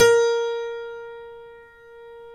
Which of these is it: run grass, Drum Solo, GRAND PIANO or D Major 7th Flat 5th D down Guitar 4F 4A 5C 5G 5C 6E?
GRAND PIANO